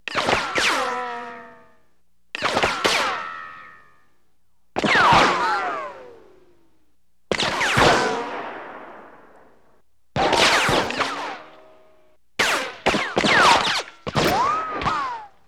Ricochets Off Rocks; Weapons & Explosions

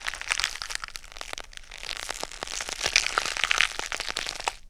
Bone Crunch & Snap, Hand To Hand Combat

